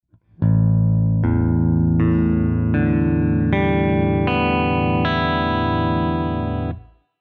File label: guitar Drop A